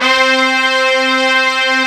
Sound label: SYM BR E C 5, Korg; Back In Time Records; Korg Universe VOL-1; Korg SYMPHONY2